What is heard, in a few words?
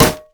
basic attacks; Dr. Mundo; TPA Mundo